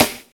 raw snare sample C sharp key, Loudest frequency: 3782Hz; snare drum samples